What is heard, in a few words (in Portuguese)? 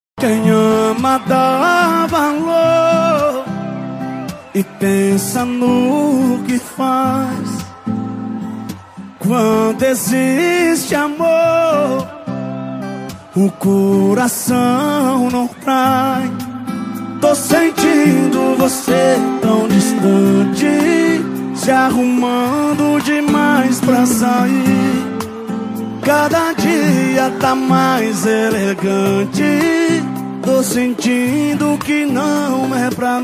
sertanejo com locutores de rodeio